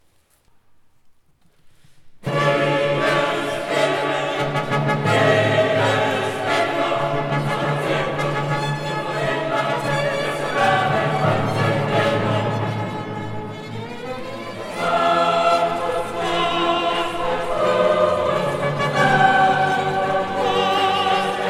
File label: Requiem, Dies irae; KV-626; Wolfgang Amadeus Mozart